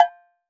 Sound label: Agogo Lo; Roland BK-5; Percussion